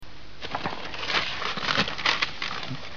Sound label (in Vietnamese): vo vay vo to giay thanh mot qua bong